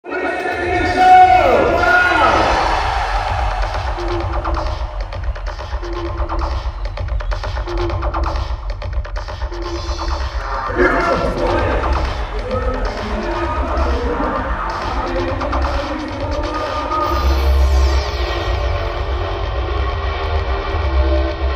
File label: Drift Slip Scream; Need for Speed Shift 2 Unleashed; Slightly Mad Studios